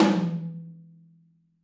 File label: Drums Percussion, Kit1 Room Mic